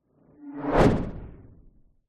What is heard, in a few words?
science fiction ultra fast pass by whoosh; zapsplat